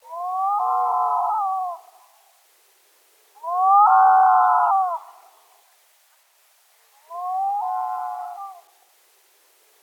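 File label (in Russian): beloklyuvaya gagara golos, yellow-billed loon voice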